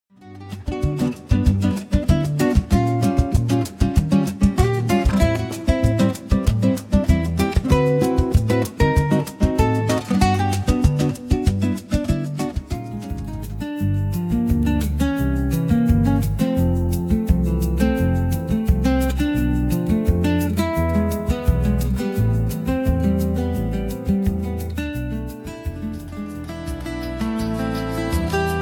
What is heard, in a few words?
Retail Lifestyle Acoustic Movement